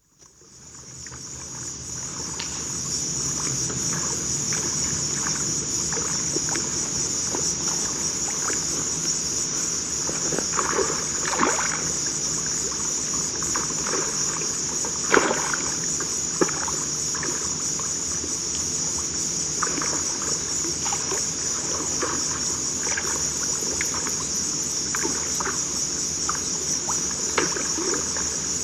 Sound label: Wading In a Forest Stream; Dr. Sound Effects; 210 Nature Sounds - 20 Hours of Relaxing Natural Ambiences for Meditation and Sleep